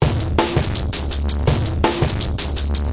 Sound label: 165 mega distorto junga; 161 to 180 bpm